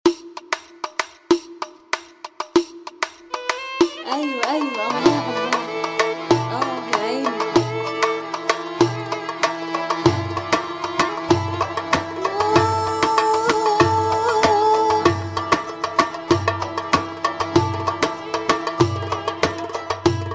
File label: Bellydance Drum